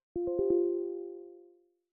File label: Notification Toast; Microsoft; Windows 10x